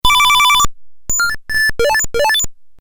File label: nightingale